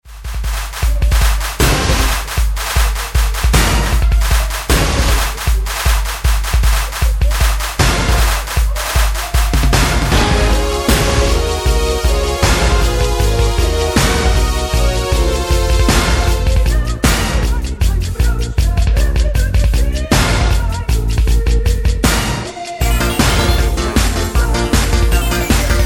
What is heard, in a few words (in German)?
mit Chor